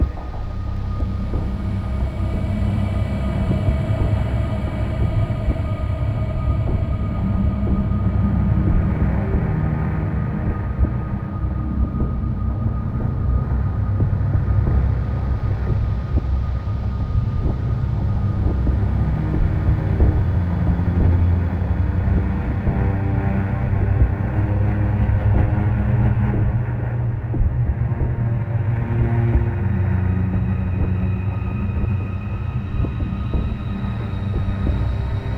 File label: Sea Base Ambiant; N.O.V.A. 2 The Hero Rises Again